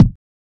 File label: kicks